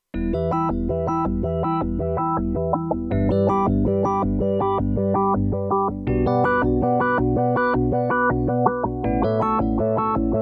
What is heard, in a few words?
Kurzweil K2500X; Factory Programs